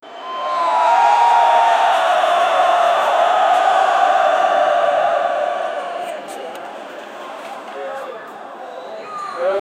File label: cricketer entering the cr, cricket